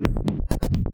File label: loooop